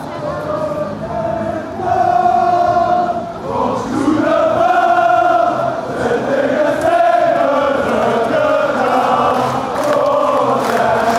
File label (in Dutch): lokeren zingen